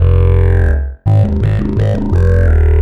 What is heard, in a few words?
Coit Bass F, Bass Loops; Cyclick Samples; Kit Two